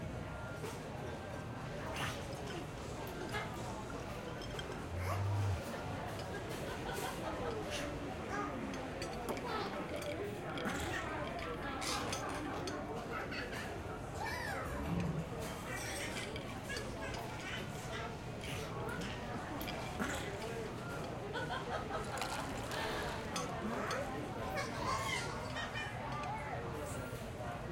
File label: amb penthouse; tft